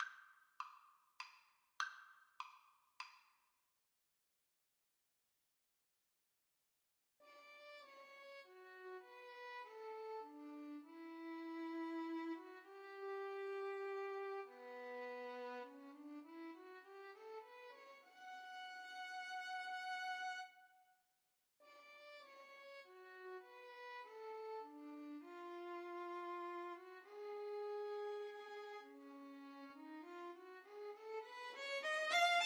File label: Violin Duet